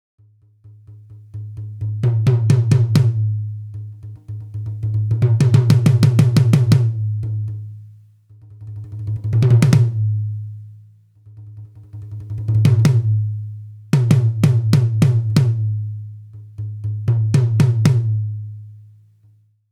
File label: tom 10 no reso; 10 Inch No Reso Tom; professionally recorded acoustic drums